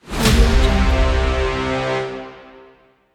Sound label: brass hit; fx-market